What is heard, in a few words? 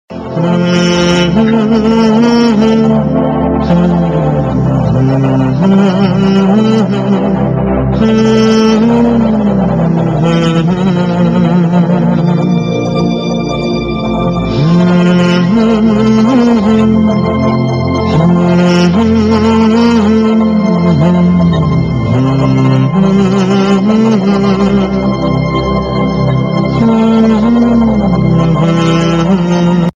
Title Tune Instrumental; TV Serial Tone; Star One; Dill Mill Gayye